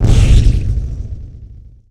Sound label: Impact Sweetener; Impacts & Destruction Sweeteners; Sony Pictures Sound Effects Series Volumes 1-10; Sony Creative Software